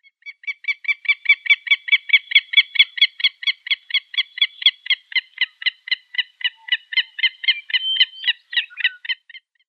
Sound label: Willet calls